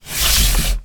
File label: car steering wheel hand rub movement; transportation; sound effects; sound effects one